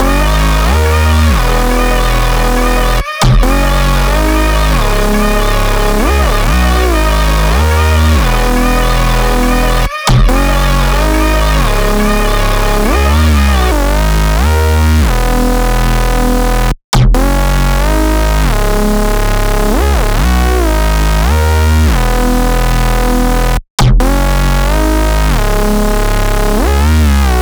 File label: 140 BPM F# Min, Speed House, Melodies; Annihilate